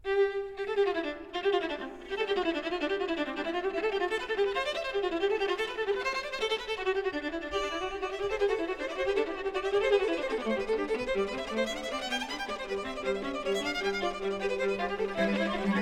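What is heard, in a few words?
String Quartet in C major; Beethoven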